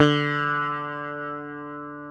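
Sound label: ELECTRIC SITAR D3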